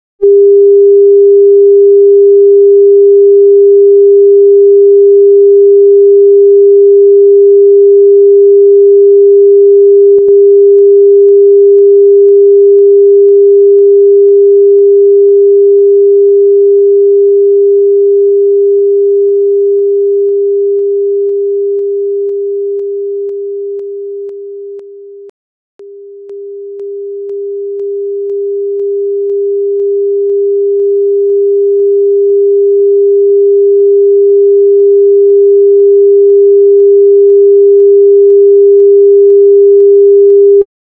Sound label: 401hz (Stereo) [phase Linearity, Distortion]; Denon Audio Technical CD